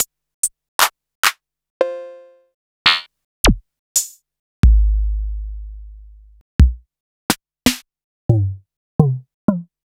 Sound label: Electro, Kit